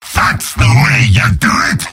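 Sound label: positive vocalization, male; demoman; tf2; garrysmod; mvm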